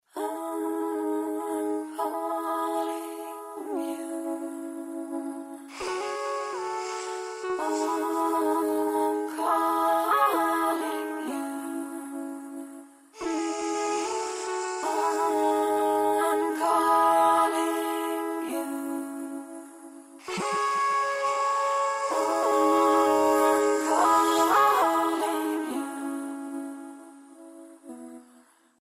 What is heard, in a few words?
fem vocals capella